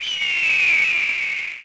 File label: Train Loop; Cars; XBOX